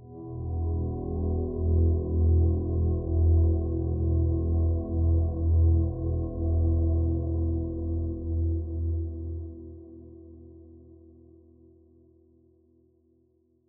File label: suspense; DayZ-Epoch; dayz sfx